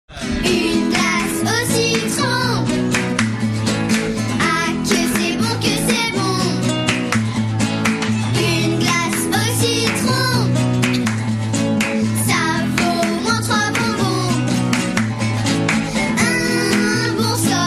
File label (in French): acoustique